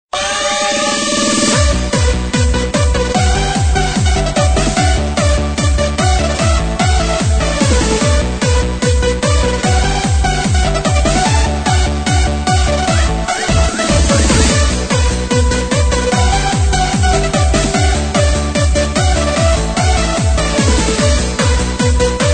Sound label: Awesome Techno Ringtone